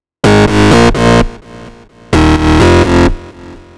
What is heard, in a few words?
Bass, 127bpm, Nu Dance Electro; Zero-G; Soundclash; Acidized Wavs; Combi Loops; Southbeach Infusion